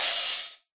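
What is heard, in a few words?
classic hh Cymbal; Hip Hop; STYLE KITS; Classic Hip Hop Mega Kit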